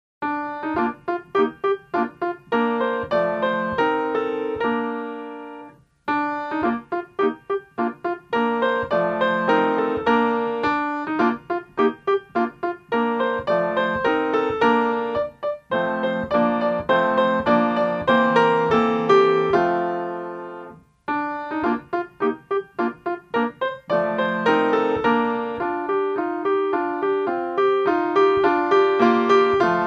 the ducks music only, childrens songbook portuguese